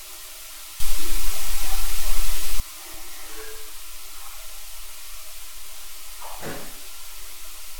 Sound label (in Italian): Vocalizzo rumori e colpi; Bogoni